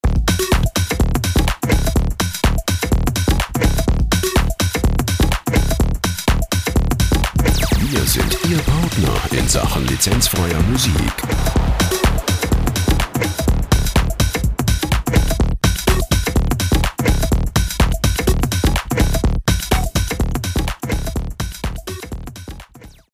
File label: rhythm